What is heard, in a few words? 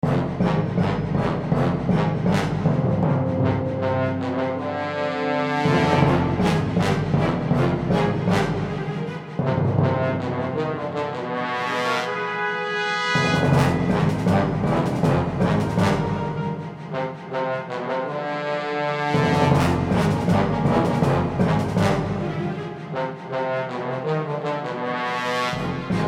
battle music